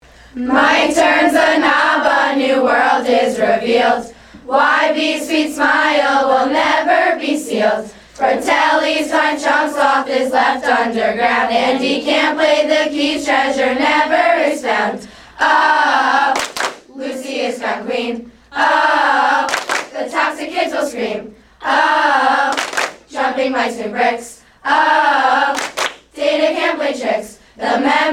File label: Cheer